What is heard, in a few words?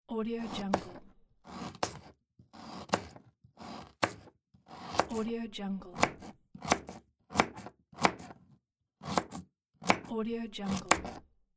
carrot chopping